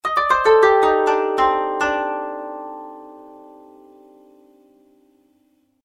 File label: Koto